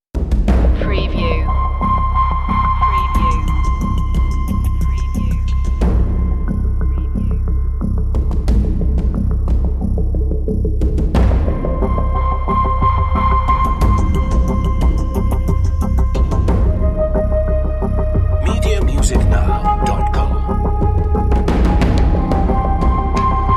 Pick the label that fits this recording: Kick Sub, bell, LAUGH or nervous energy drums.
nervous energy drums